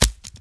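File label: grass; Mafie 1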